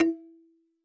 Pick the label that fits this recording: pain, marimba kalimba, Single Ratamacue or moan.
marimba kalimba